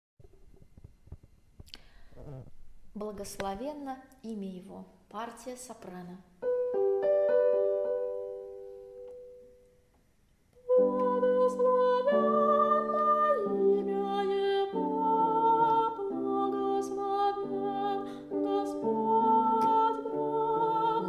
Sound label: soprano; Blagoslovenno Imja Ego